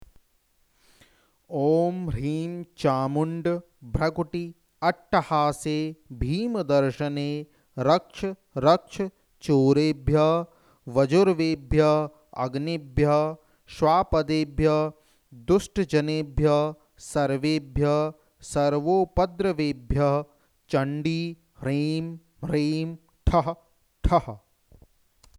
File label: Chandi Raksha Mantra